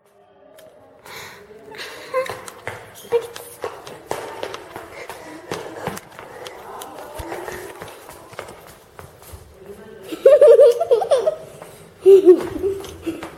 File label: Little Girl Running And Laughing